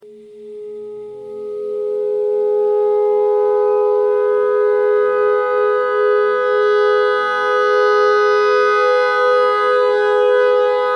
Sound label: clarinet notes e clarinet notes e pentatonic